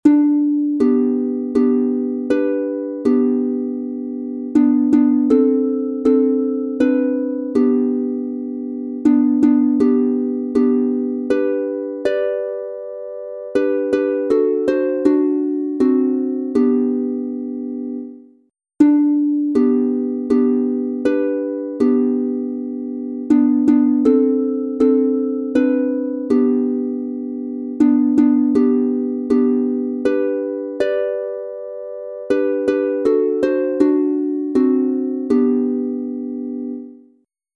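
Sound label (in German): G Dur 3 stimmig instrumental, Harfe; Im Wold is so staad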